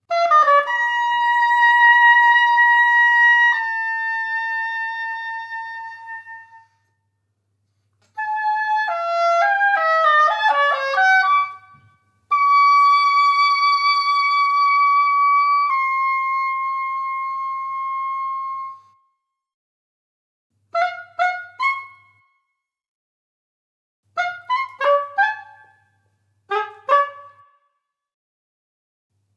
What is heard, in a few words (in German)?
Interludium für Oboe; TRILOGIE FÜR OBOE